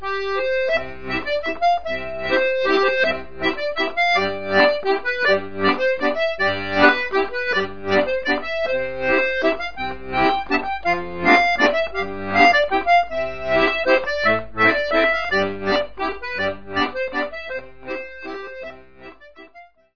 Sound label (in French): Mazurka, France